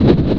motion; animal

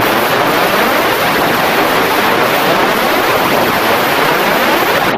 wind

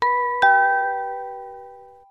chime; operation

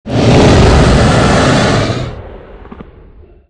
roar of dragon; animal

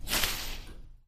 step on leaves; animal

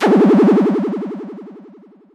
hit

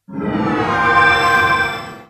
magic

